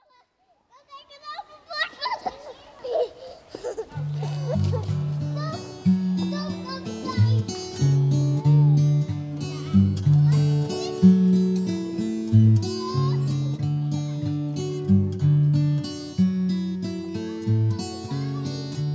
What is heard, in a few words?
folk